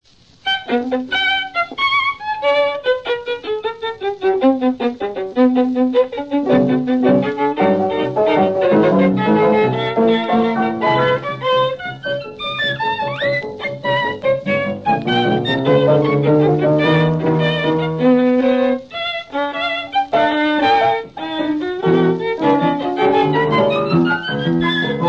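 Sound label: three pieces violin piano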